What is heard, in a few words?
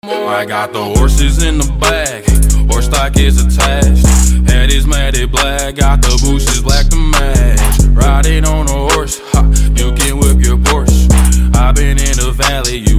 english song ringtone